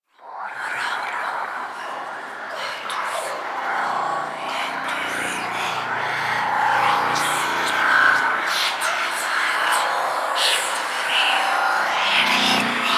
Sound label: Whispers High Pitch; Warframe; Ambience; Entrati